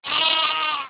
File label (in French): agneau